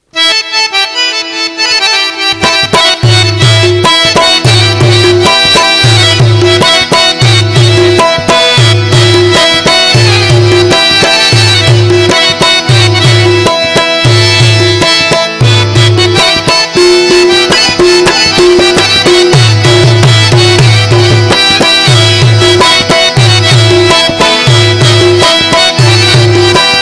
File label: Pashto Mast Tone